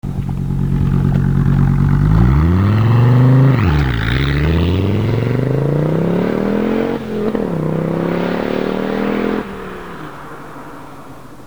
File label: audi ur-quattro 10v